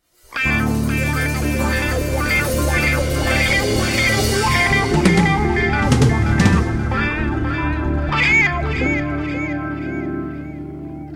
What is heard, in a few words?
Blues